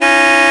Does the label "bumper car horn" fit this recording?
Yes